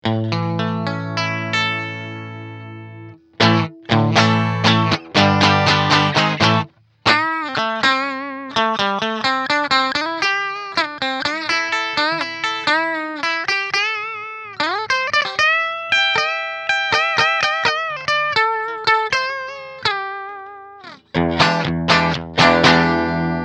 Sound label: PU rea Clean; strat; aria PU